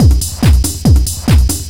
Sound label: 141 BPM, Drum Loops; Zero-G; Club Techno; Drum Set